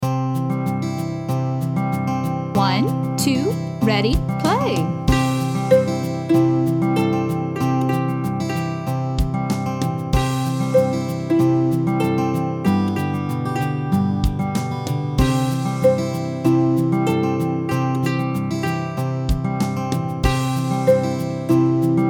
Green Cats Eat Ants slow tempo with intro with ukulele; Rainbow Ukulele Play-Along